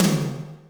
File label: Yamaha RM 50 Toms